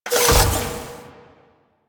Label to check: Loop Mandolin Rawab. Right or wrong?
Wrong